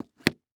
Foley Footstep Hard Sole Dress Shoe Single Step On Cement; Foley Footsteps